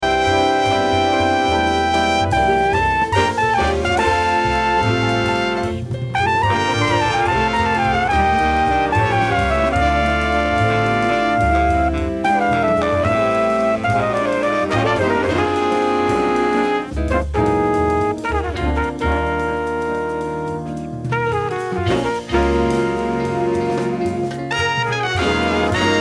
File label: Birds Fly